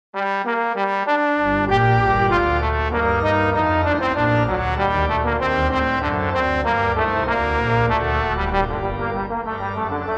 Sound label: Handel Sonate G moll